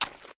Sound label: mud walk, step